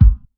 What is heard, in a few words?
kick sound g key; Duration 0:01; Samplerate 44100 Hz; Channels Mono; single-hits-one-shots